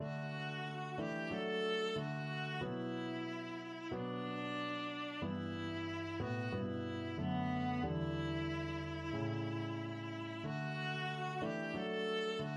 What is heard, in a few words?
viola